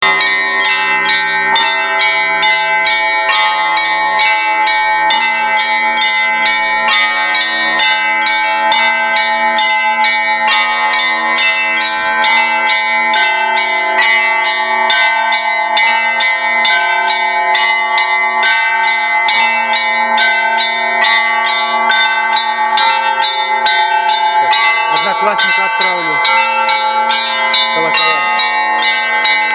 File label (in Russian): КОЛОКОЛЬНЫЙ ЗВОН